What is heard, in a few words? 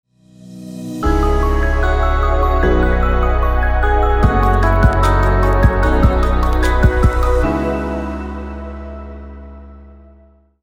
calm inspiring technology logo short version; alexzavesa